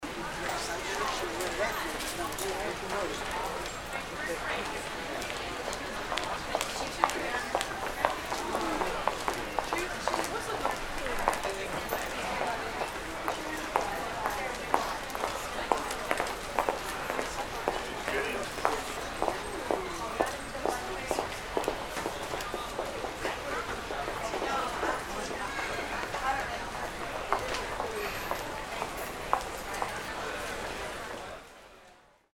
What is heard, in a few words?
shopping center small